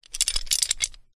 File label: metal thin strip twist sequence gear open slide texture; ancient game